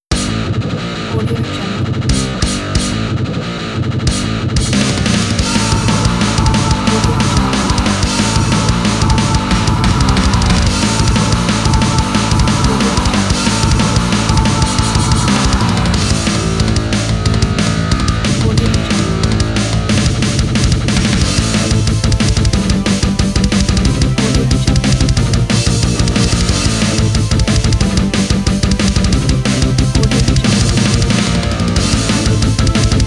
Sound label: 80s thrash metal